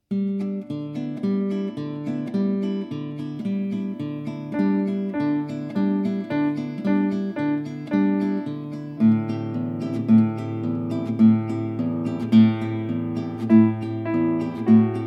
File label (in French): les animaux de compagnie instrumental guide chant; Comptines